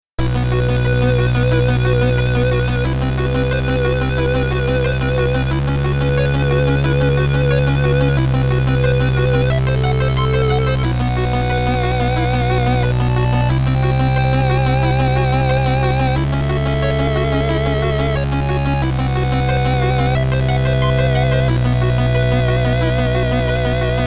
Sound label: Underwater Dungeon (Port Town Underwater BGM); Esper Dream 1 2 Sound Memorial